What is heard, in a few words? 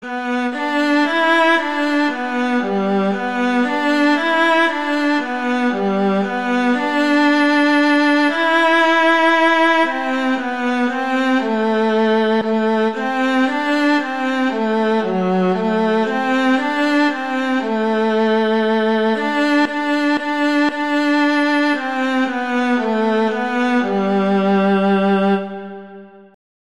Blow the Man Down, Viola Solo